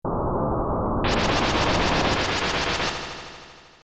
flare; ff3; ff3us

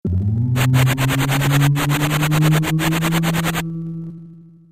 meteor; ff3us

